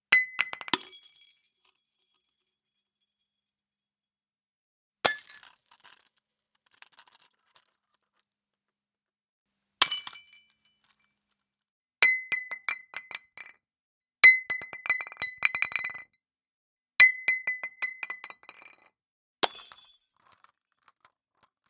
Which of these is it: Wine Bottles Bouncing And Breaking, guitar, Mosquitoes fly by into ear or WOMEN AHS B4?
Wine Bottles Bouncing And Breaking